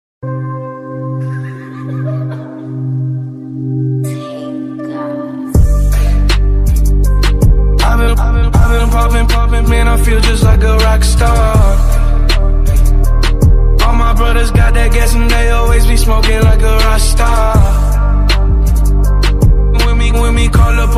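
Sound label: instrumental english music ringtone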